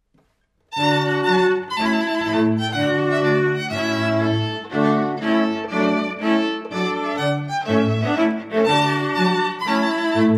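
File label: Trumpet Tune; Purcell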